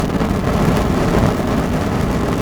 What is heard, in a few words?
Loop Fire; Goddess of Trampling